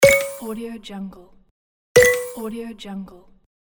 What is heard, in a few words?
wood click